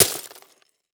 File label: Impact Plastic Debris Hit By Metal Pipe; Impacts